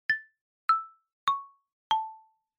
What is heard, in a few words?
Chime Announce 4 Tone Slow Down